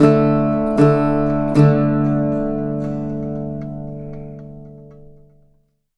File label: Arabic Mandolin; Sonic Foundry (Sony Creative Software); Continental Drift Aftershocks